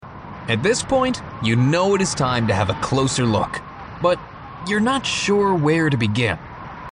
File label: snow wind noise